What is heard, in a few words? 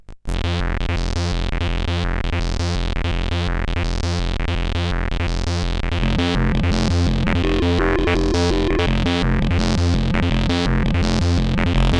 Hardware Malfunction sid CSG8580R5